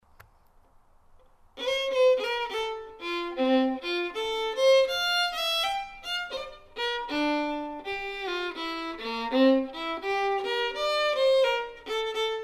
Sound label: Hornpipe, fiddle; Impish Hornpipe